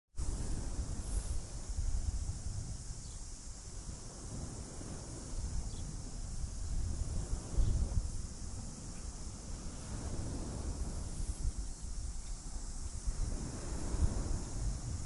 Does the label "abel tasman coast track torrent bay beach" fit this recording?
Yes